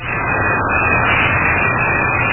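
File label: noisy sound of steam release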